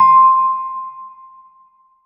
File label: Bell